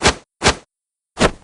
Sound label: hand out